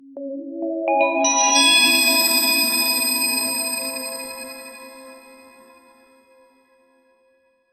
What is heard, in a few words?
bright digital, major chord; bright 15 second digital